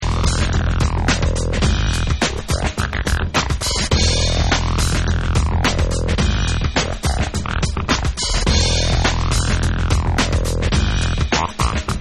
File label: Instrumental